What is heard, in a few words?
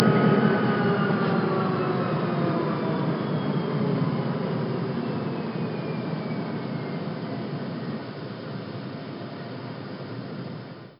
gp35 shutdown